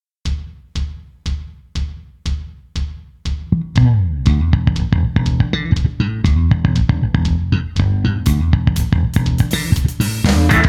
squier jazz bass riff adlib

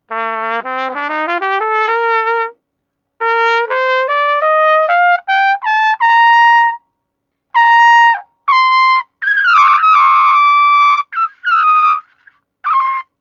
Bach Mega 1C

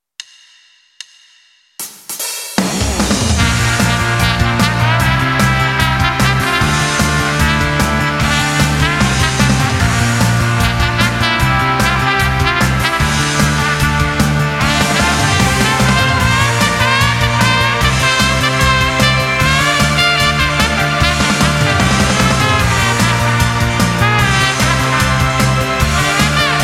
slide trumpet

